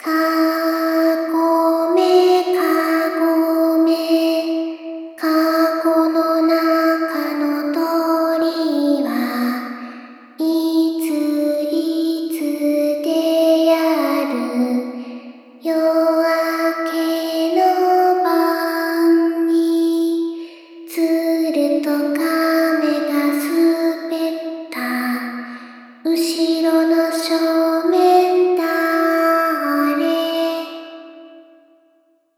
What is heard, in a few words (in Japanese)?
Kagome Kagome Reverb